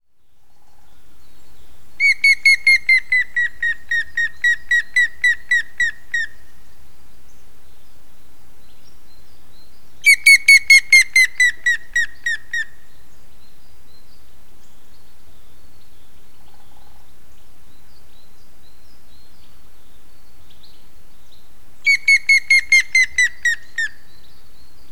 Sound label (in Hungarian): hamvas küllő kiáltás